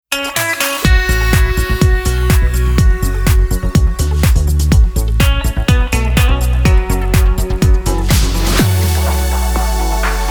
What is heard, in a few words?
Jingle B Ramp; Europa Plus